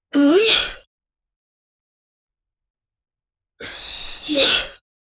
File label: sneeze